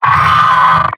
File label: pyro pain severe; vo; tf2; mvm